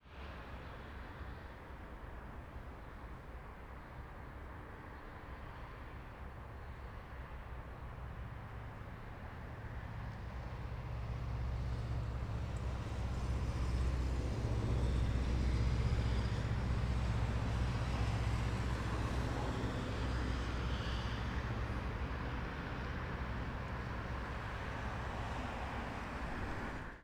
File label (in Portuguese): Eixo Rodoviario Kombi Passando; transito; Colecao Sonora do Cerrado